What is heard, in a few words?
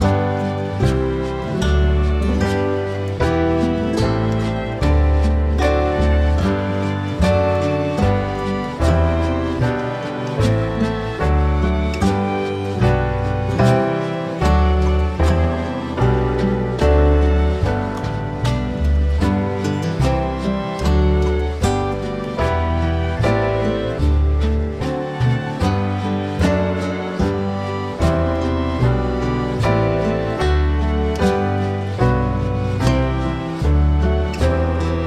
Eb E Major Scale; simplybrass